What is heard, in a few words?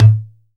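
low conga; Cuba; AKAI S6000 CD-ROM - Volume 5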